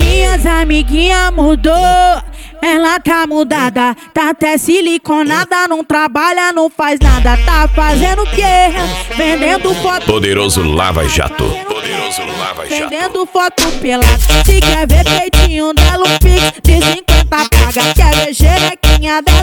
funk sertanejo

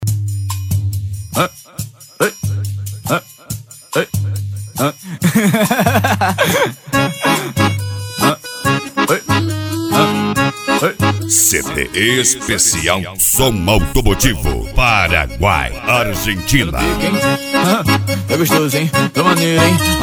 som automotivo

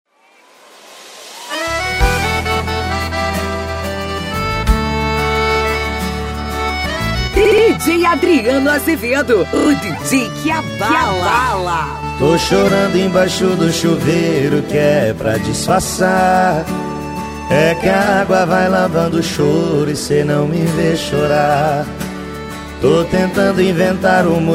eletro funk e sertanejo

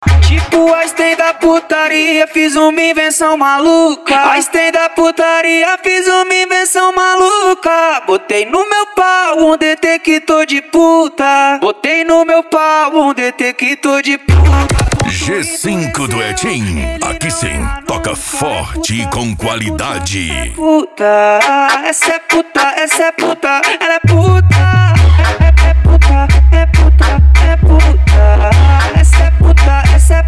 mega funk e funk mega bass

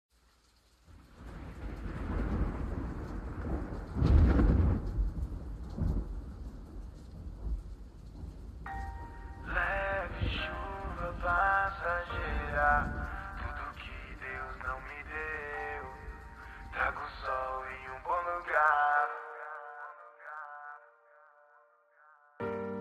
graves bass

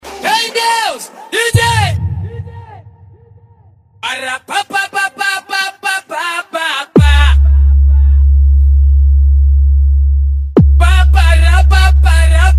esp mega funk bass

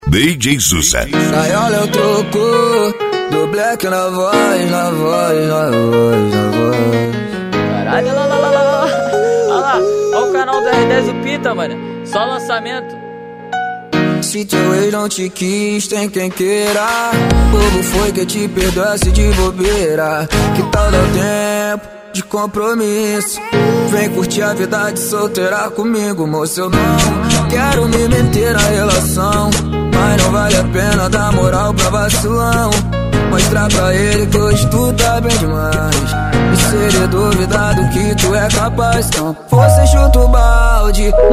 sound car especial mix

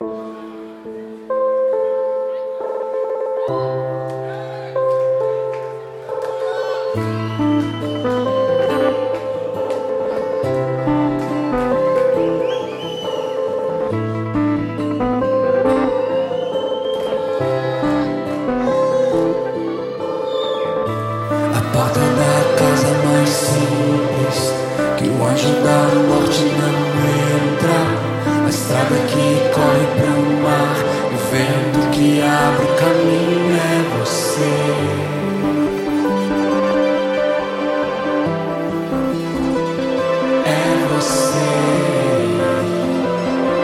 ao vivo, gospel

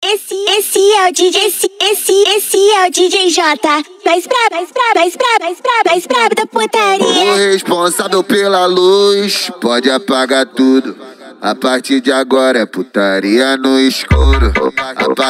noia dance e funk mega bass

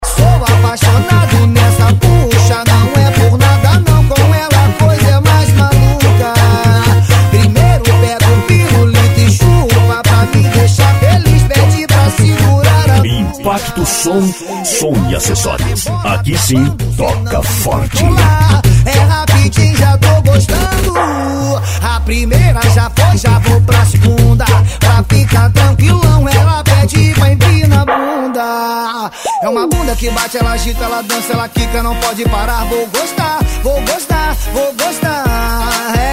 funk bass; impacto som

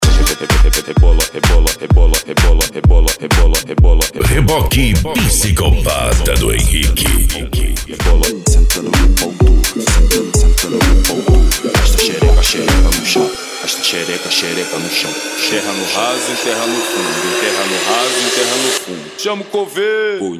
eletro funk

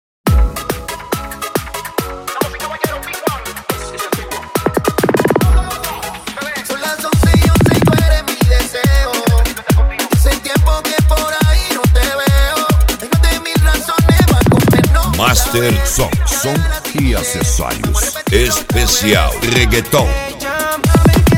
reggaeton

